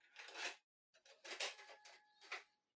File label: Portable Stereo Far MD Insert